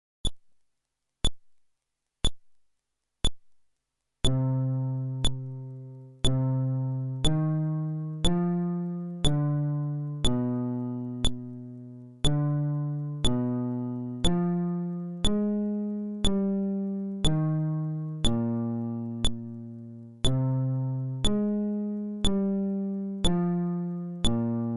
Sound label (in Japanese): フレットレス